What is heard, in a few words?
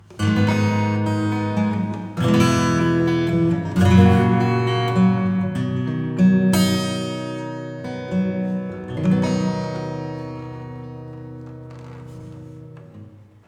acoustic Bright Hall; Reverberate 3